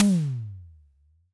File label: TD-20 Tom B, Roland TD-20 Toms